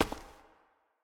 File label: cave grounds step; Golden Crayon Sounds